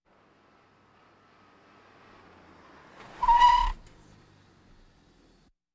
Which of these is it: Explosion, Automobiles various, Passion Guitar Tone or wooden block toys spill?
Automobiles various